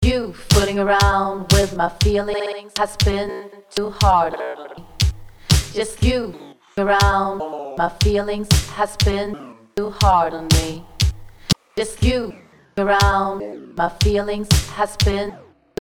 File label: beatrepeat vocal